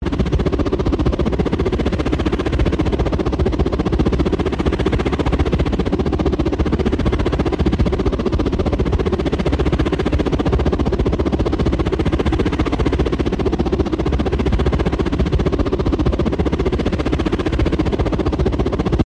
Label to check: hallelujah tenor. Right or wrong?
Wrong